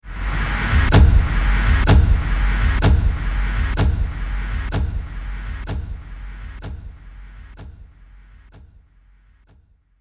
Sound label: Drum Impact Movie Trailer